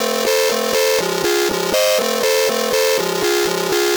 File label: C 121, Synth Loops, Acidized; Zero-G; Soundclash Nu Dance Electro; 121bpm